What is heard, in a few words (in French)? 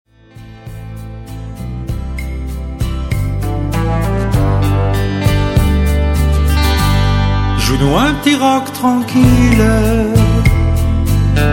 Rock Tranquille